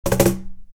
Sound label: cajon snare; creaktor; mozee